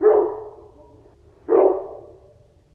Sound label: distant, dog